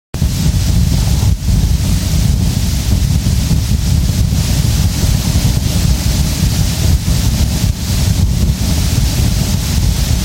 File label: Morning Noise Broadcast